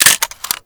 Uzi Reload Clip Out; Tenno

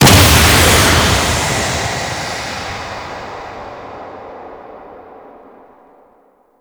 Capture Obj Ship Fly Out Start; Warframe; Grineer Galleon; Capture Objective Room

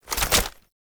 Shotgun Reload; Warframe; Tenno; Alchemist

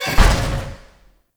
Piston Fall Short; Warframe; Eidolon Plains

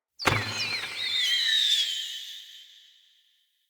Bow Shot Arrow Rain; Weapons; Player; DendritBow

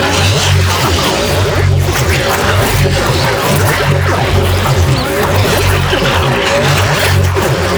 Void Portal Loop; Ambience; Props; Corpus Ship

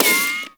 Bullet Impact Ricochet; Warframe

